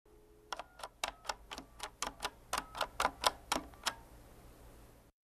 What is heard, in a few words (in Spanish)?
maquina de escribir